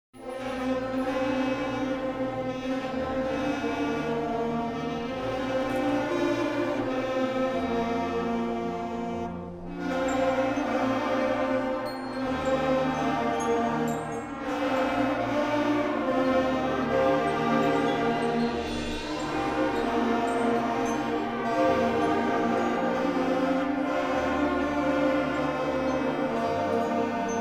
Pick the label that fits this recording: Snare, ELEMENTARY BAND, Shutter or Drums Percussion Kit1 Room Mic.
ELEMENTARY BAND